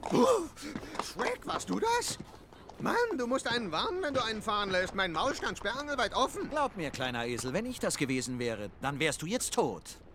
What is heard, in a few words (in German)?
Furz; Shrek